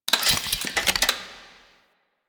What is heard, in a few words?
Ocean Toxin Cell Chamber Open; Ambience; Warframe; Grineer Ocean; Ocean Sabotage Injection